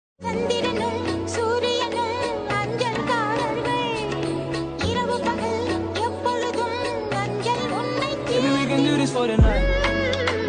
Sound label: trap remix